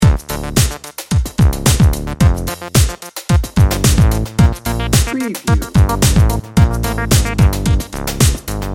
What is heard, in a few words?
bouncy electro pop loop